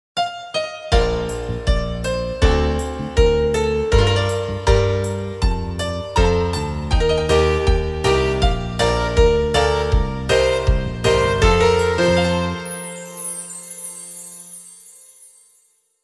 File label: jingle